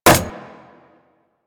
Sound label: Rolling Drone Landing; Warframe; Grineer